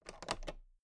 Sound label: toolbox latch close soft